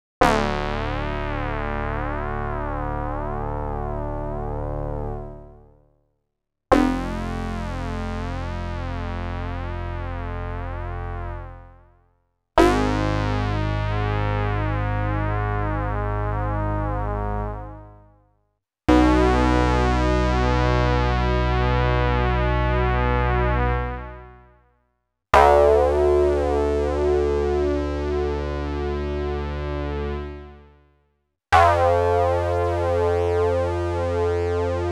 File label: CS Multi Multi, Synth; Klaus Schulze Collection